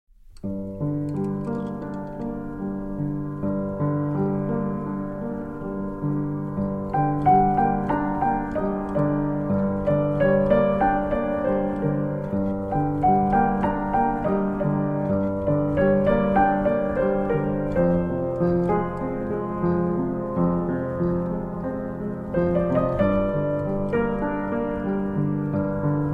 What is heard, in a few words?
Phrygian